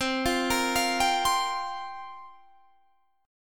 C 7th Suspended 4th, guitar; C7sus4 arpeggiated

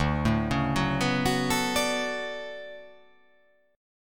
D Major 13th D down

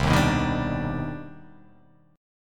C Minor Major 9th C

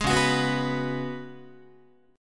Db 7th Suspended 4th Db down Guitar; JGuitar

